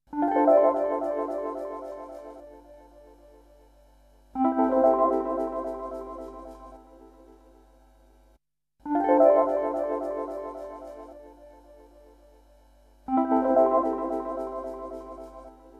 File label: epiano